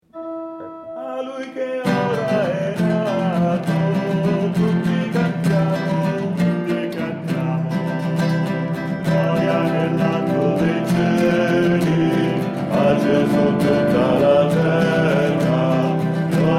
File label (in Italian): Bassi, Coro; Dall'alto dei cieli